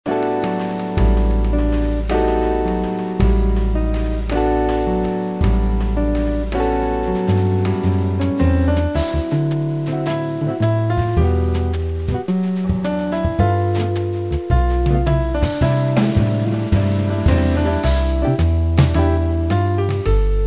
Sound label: jazz